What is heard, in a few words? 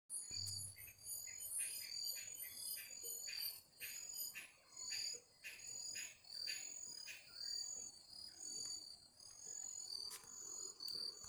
American Crow, Corvus brachyrhynchos, Aves